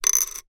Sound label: foley token plastic down spin wooden table